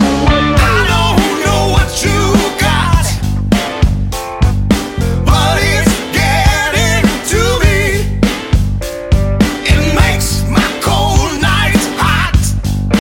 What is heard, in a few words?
hummin